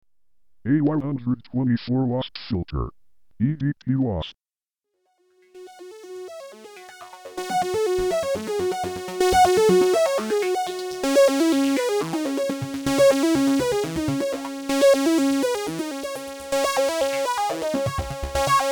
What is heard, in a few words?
A124 EDP Wasp Two Tracks